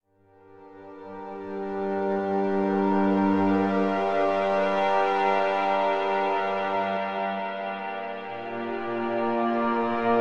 Epic Pads - G Dorian 140 bpm, Atmospheric; MIDI Clips; Tonal; Ableton; Factory Packs; Mood Reel

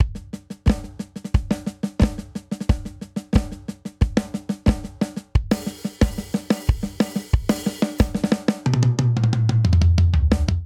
Stadium Rock Buildup 90 bpm; 4 4; MIDI Clips; Ableton; Factory Packs; Drum Booth

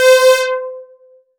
Release C5, analog synths; Ableton Foundation FreePacks

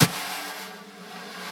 SNARE brushes sweep

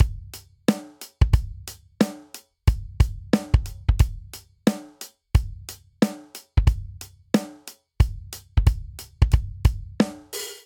Swing Hip Hop Verse 90 bpm; 4 4; MIDI Clips; Ableton; Factory Packs; Drum Booth

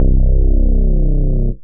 Punchy Bass; Instruments; Brooding Bass; Ableton; Mood Reel

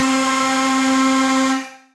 Flute, Wavetable, Synth Lead; In Fairness; Ableton; Factory Packs; Synth Essentials

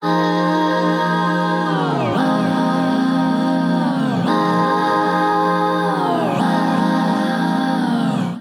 Chorale Swirl G# Major 114 bpm; MIDI Clips; Chromatic; Ableton; Factory Packs; Voice Box

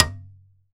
Metalbox, Household; Convolution; NI Kontakt 5

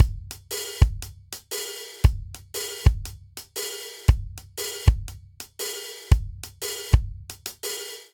Blues Shuffle Breakdown 118 bpm; 4 4; MIDI Clips; Ableton; Factory Packs; Drum Booth

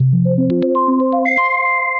Synth Rhythmic; Venus Smiles; Ableton; Glitch and Wash

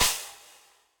Crash FX Room With A Vista, Cymbal; Drum Hits; Standard Hits; Session Drums Studio

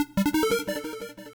175 D&B Synth Gm; Dance DJ

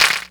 Clapbox Pitch; mpk mini presets; Ableton Foundation FreePacks

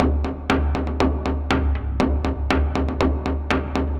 Cello Impacts; drum racks; Ableton; Factory Packs; Mood Reel

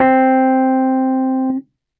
Basic Suitcase Amp, Suitcase Piano; Electric Keyboards; Ableton; Factory Packs

